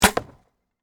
Warfare, Bow, Release, Arrow, Hit, Target, Thwap, Compound; Bow And Arrow